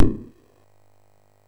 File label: SFX, MOS6581; Narco Police